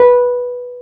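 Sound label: piano Wurly; Keys of the 60s and 70s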